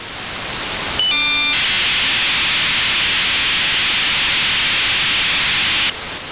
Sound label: signal nato